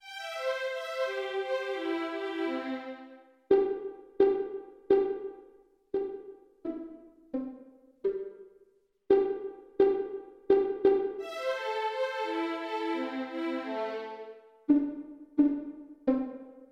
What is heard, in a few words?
Pony Trot Vln